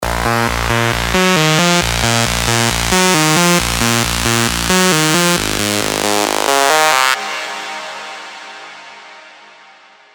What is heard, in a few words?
lead, Spire trance leads; Tomakawk